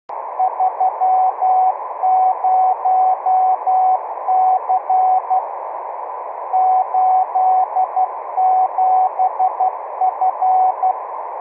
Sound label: Temp2Morse